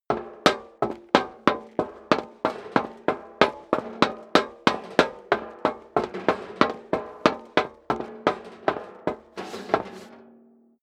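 step dress boots metal rattle run sequence, footsteps; schritte; menschen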